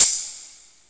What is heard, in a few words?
Tamborine, Misc Percussion